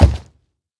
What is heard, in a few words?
hoglin step, 疣猪兽：脚步声; 行走; Minecraft; 我的世界; 疣猪兽是一种生成在绯红森林的攻击型生物。它们是目前唯一可繁殖的攻击型生物。